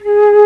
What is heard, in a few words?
TRON FLUTE; E-MU Formula 4000 Series Vol. 3; Analog Odyssey; VK Instruments